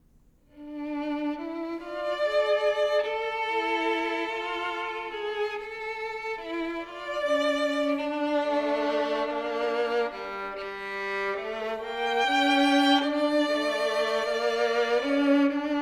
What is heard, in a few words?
Duos for Two Violins, Walachian Song